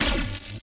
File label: shock fire layer